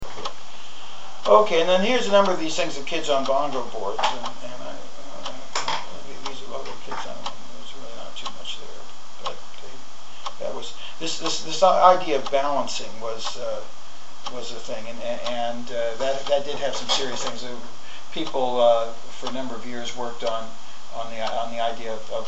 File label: bongo